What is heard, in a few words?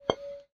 glass footstep; Too Many Stones